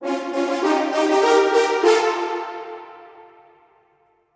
Short Brass Fanfare

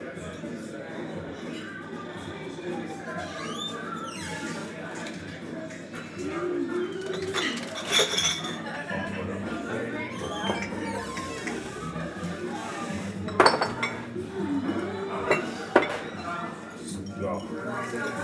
Bar Ambience Talking Music Glasses Door Creaking

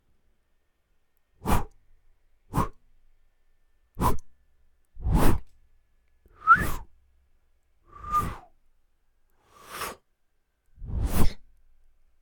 Wooshes

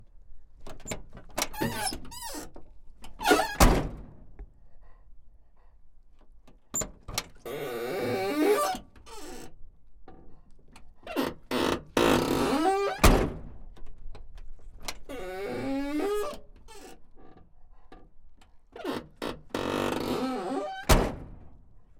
Squeaky Car Door

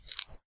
Bone Crack

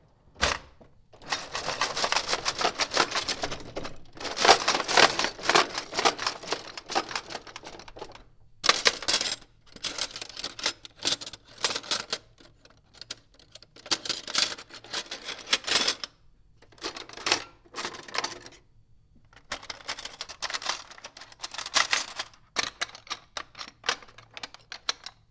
Cutlery Rattling

